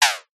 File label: multimedia laser weapon fire